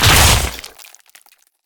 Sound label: Spider Trap Grab; Lurker; Evolve; Gorgon